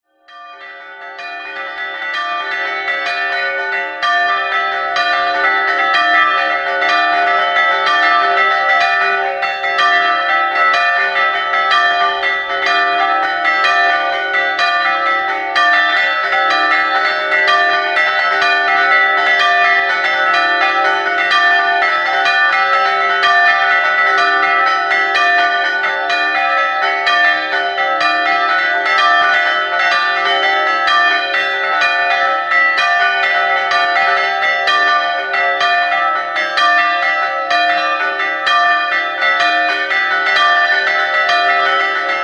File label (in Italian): campane